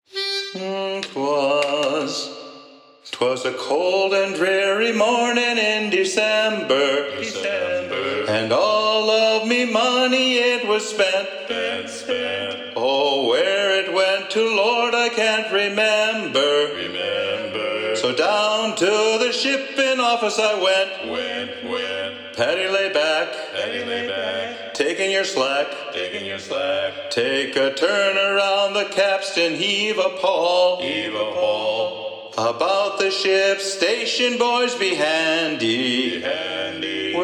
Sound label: Lead Predominant; sea shanties; Paddy Lay Back